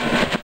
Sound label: ROLL, Drums; Distored Reality 1&2